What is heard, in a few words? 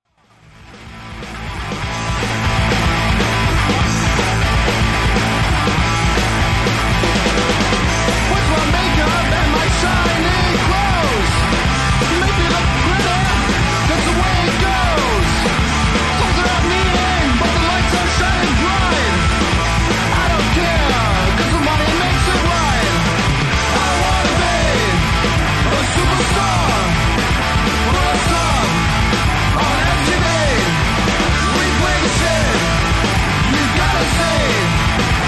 Garage rock